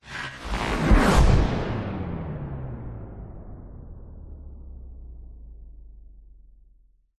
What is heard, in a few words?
sound design whoosh cracking into slow motion; zapsplat